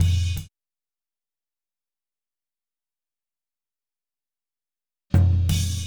Boogaloo Fill Tom Floor, 82 BPM, Drums; Sonic Foundry (Sony Creative Software) - Drums from the Big Room The Mixes

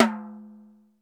DRY T, drum; Crypton; DrumBase5000

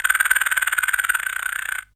Rattle; Zero-G Jungle Warfare 1, 2 & 3

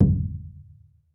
TOLOWA DR, American Natives; E-MU Formula 4000 Series Vol. 4; Earth Tones

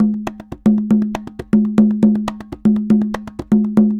CONGAS, DRUMS SAMBA; Best Service ProSamples vol.14; AKAI

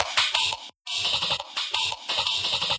Boom Bam FX, Loops, breaks; m8 breaks

